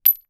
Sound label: Weapon Bullet Shell 40 Drop On Dirt; AdobeCC